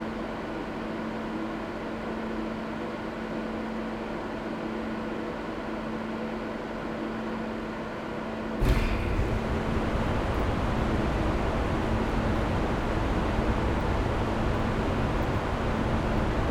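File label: 3.6-metre telescope cooling, La Silla; cooling system on; stereo; La Silla ESO 3.6-metre-telescope cooling system on; soundscape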